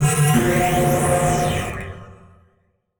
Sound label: Transition Communication Distortion Scanner; Production Element Title Transition